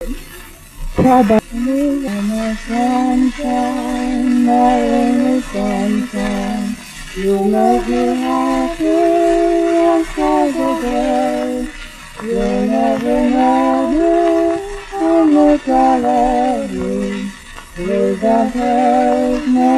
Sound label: polka